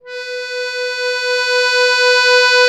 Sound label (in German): AKK VIOLISW, akkordeon